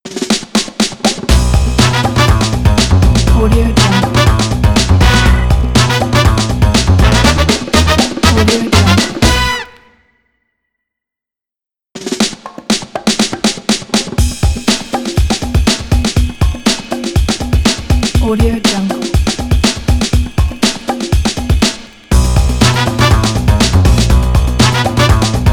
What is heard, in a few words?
funky comedy logo